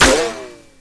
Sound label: cg metal; weapons; Tribes2